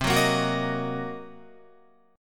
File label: C Minor 7th Flat 5th Guitar, chord; Cm7b5; strummed